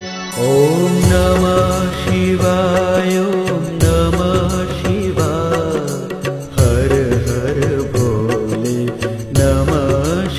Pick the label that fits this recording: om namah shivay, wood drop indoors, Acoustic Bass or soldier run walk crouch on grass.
om namah shivay